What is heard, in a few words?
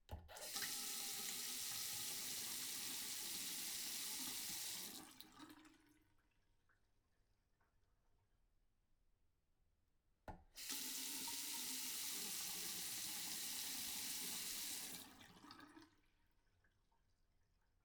INT HOUSE Bathroom Sink On Off Close; Coll Anderson